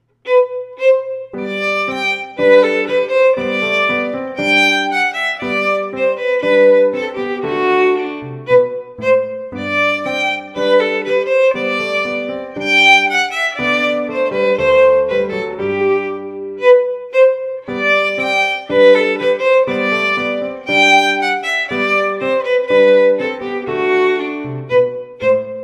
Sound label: Gavotte, violin; violin music collections